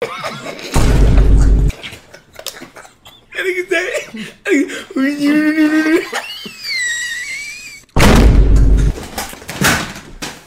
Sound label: laugh; fanum